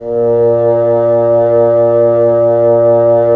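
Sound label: wind bassoon; LA Composer vol. 3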